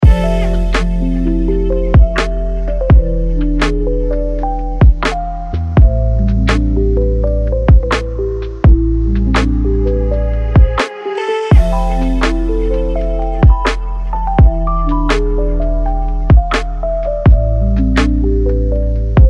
lo fi hip hop lo fi beats

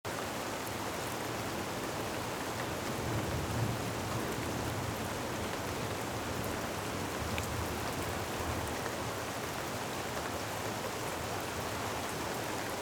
rain thunder

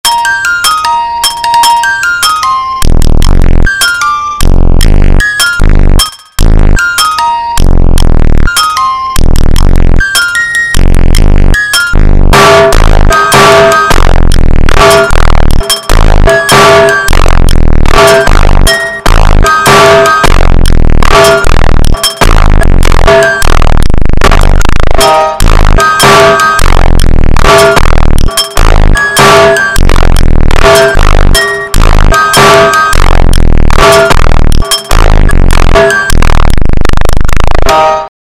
jingle bells phonk

